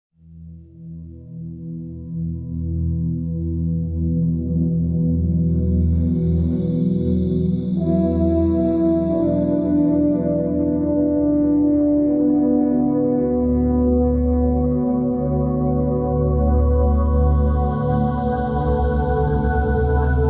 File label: Space Trance